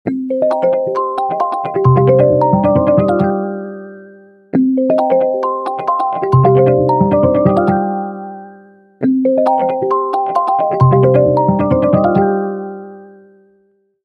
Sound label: Buoyant Encore Remix, iOS 26 ringtones; Apple’s iOS 26